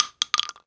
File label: shell bounce, weapons, shells; ModernWarfare